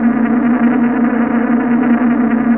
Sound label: pneu race; Mafie 1